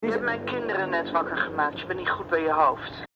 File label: Intercom